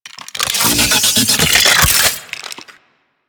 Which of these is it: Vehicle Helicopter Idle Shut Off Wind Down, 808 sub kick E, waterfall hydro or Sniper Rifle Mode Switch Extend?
Sniper Rifle Mode Switch Extend